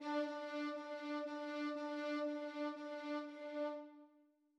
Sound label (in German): synkopenbeispiel achtel